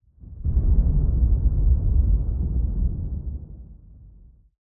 Deep and Far Explosion; SoundsCrate

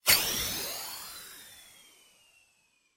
Shimmering Movement; soundscrate

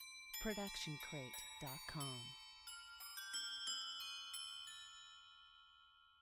Christmas Bells; SoundsCrate

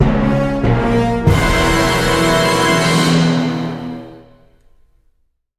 dun dun dun; SoundsCrate

